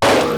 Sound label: Loud Thud; Snoopy Tennis; Fan Game